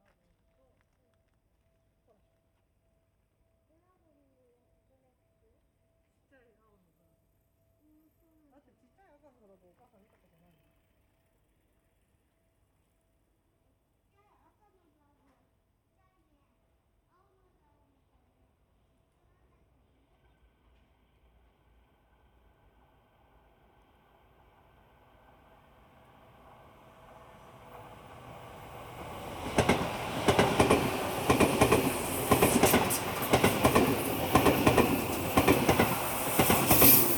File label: Binaural, walk; Field recrding walk